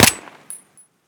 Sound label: silenced, weapons; garrysmod